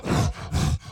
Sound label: Enraged Breathing Loop; The Town; Burns